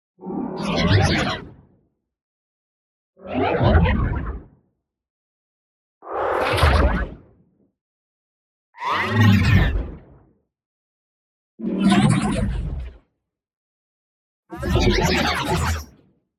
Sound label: ABILITY CHARGE Neutral Selection; boom library; Matter